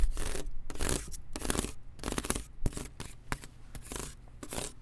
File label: Ridged Texture; BB Retail Therapy Sample Pack